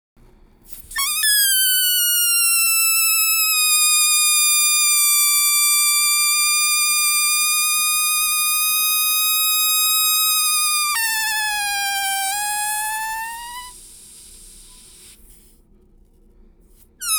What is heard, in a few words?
Balloon